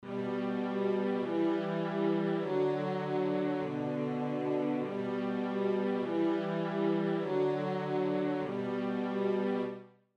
delay

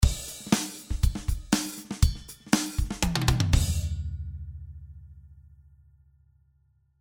drm test

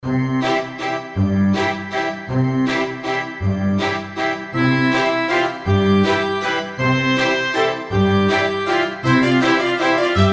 bpm160 inst

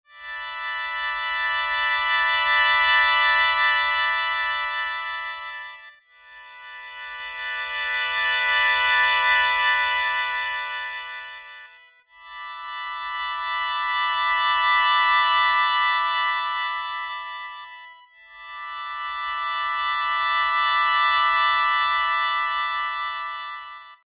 Sho

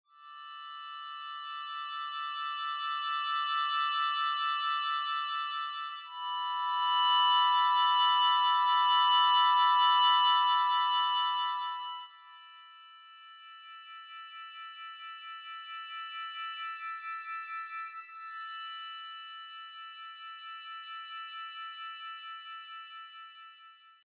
Sho

